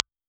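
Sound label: impulse response STC4033 Cardioid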